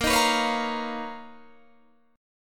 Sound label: B Major Sharp 11th Bb